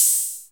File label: HAT OPEN; Drums; audiomulch; simplekit